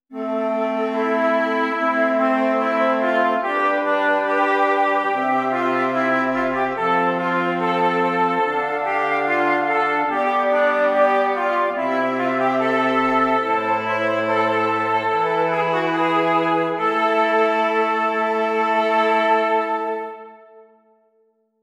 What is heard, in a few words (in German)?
Stimmiger Contrapunkt; 5 Gattung; Ronge